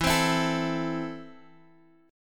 A Minor E, guitar

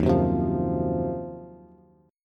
Eb Minor 6th Add 9th Eb down Bass; strummed

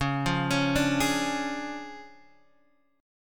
D Minor Major 7th Flat 5th, Db

